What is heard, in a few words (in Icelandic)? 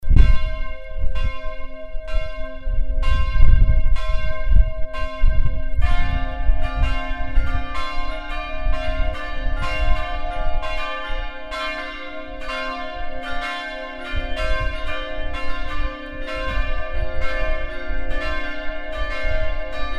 bustadakirkja lok messa, kirkjuklukkur